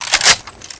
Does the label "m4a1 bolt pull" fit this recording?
Yes